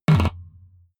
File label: button, signale; soundpool